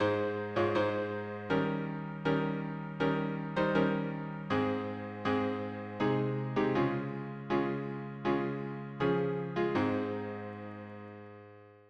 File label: harmony piano